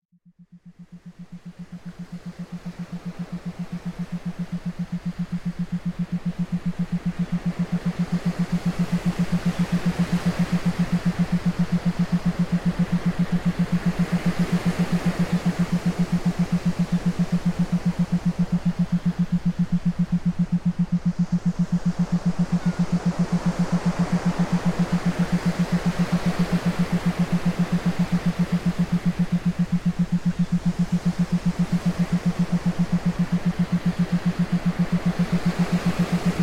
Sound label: Binaural Beats Theta Meditation Iso Waves